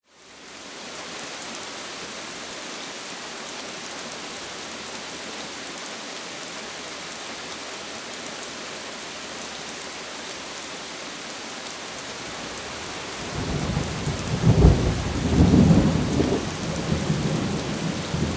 Weather Thunderstorm, Medium rainfall with occasional distant thunder roll.